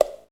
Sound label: jackhammer ricochet; projectiles; Max Payne; clips